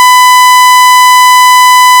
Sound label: synth